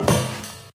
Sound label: metal grate, footsteps